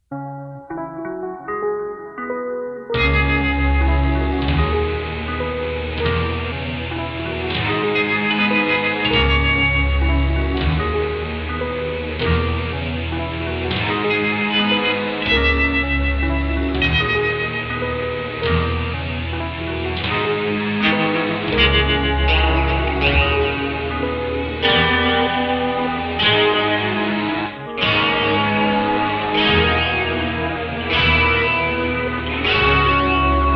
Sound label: Echo